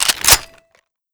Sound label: m14 bolt release; svencoop; hunger; weapons